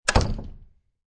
Door Close Wooden Door, Household, Door Close, Wooden Door; Miscellaneous Door; Doors